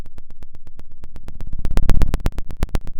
Sound of a fire crackling

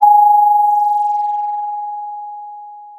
pop, Sound of a phone ringing

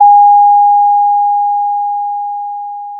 Sound of a school bell

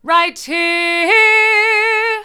right here hard, vocal

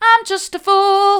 i'm just a fool soft, vocal

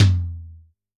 Tom, drum

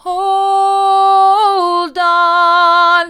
hold on mid, vocal

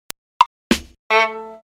clicks and sounds

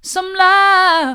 mid, vocal

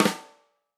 Tam Flam, drum

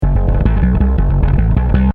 bass

